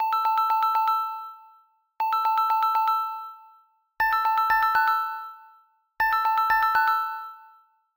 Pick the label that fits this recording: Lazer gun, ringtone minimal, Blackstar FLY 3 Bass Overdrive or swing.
ringtone minimal